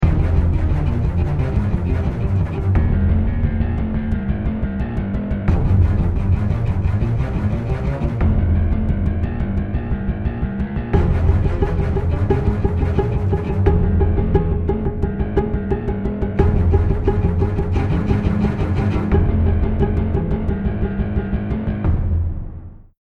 start round, music

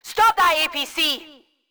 gate; campaign; wildfire

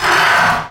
metal frame scrape; Portal 2

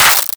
spark tiny

